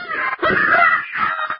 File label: pain, poisoncrab; svencoop; hl2